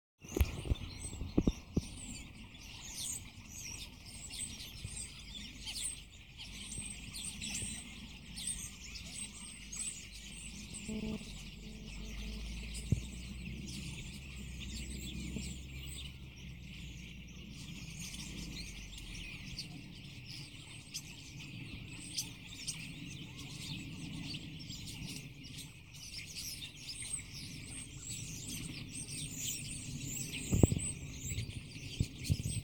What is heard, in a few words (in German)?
Vogelstimmen